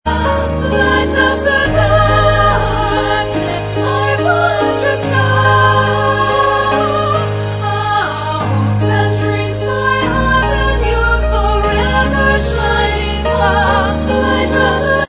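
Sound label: choir backing vocals track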